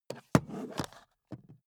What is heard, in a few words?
charger put down